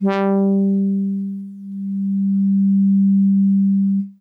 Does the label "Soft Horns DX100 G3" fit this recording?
Yes